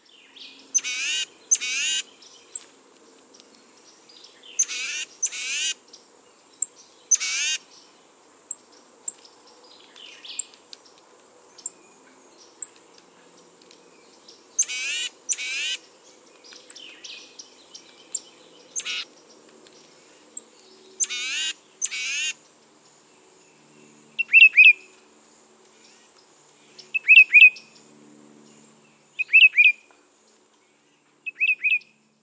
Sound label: Tufted Titmouse; Birds